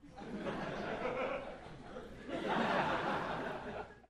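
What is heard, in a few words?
Crowd 100 Short Light Burst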